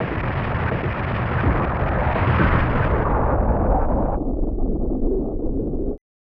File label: Unknown Ambience; Macross Scrambled Valkyrie; SNES